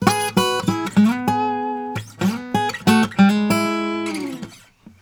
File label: Blues Guitar lick; ILIO; Fretworks; 96 HIRUM LICK